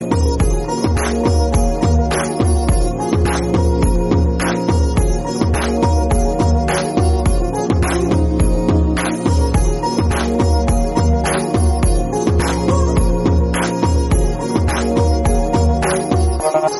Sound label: cute veena